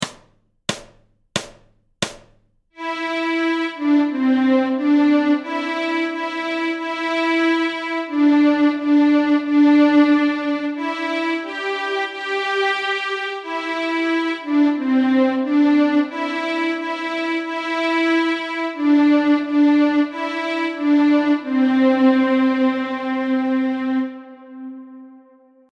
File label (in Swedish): Stråk C, Nyckelharpa; Merrily Roll Along; Notträning